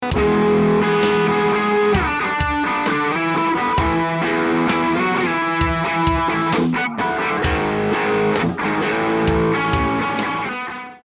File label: Packrat BRAT; JHS Pedals